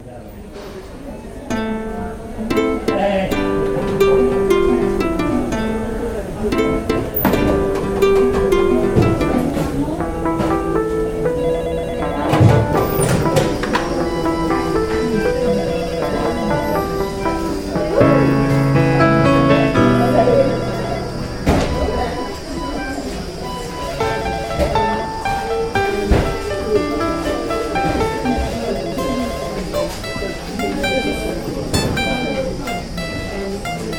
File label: sample hospital noise; curbing hospital noise